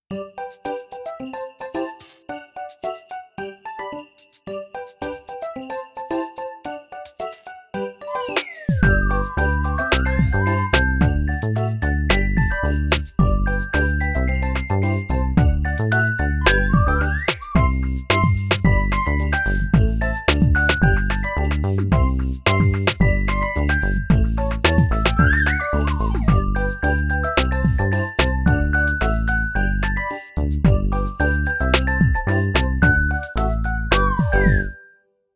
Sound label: Child Music, Christmas